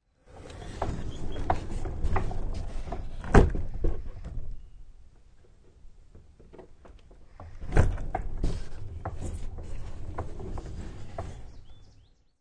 Sound of an old barn door sliding open and then sliding shut.; Barn Door Open-Close